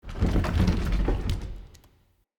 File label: Bookshelf Move Start A; Movers